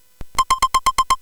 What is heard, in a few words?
Shuffle, CSG8580R5